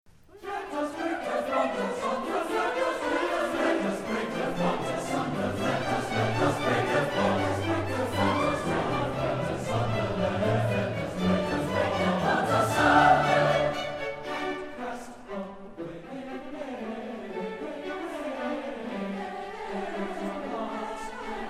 concert choir; let break their bonds asunder

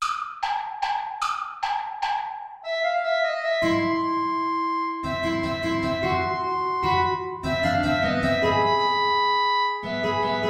play along without guitar; traditional

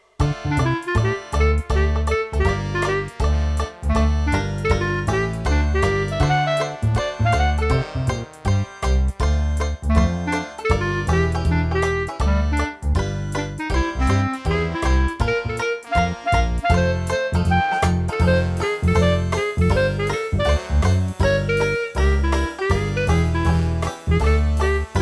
dixieland

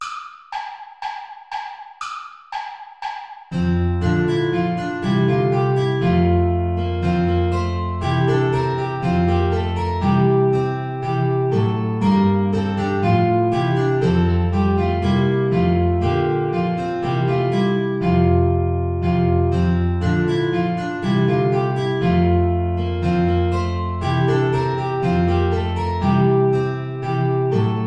play along without guitar; praetorius michael bourra

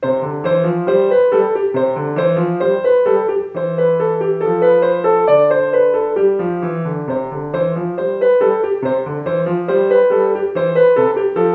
gavotta; hook james

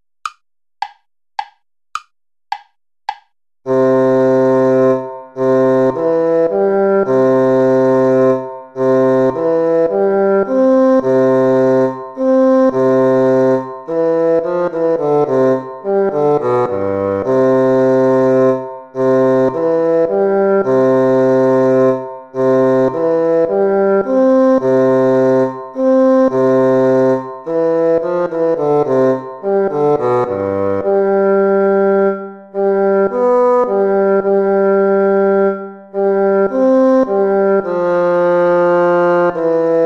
minuetto play along; hook james

